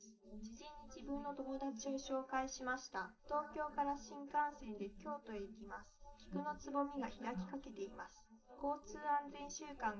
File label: female live rec 250ms 1m; SiSEC11; underdetermined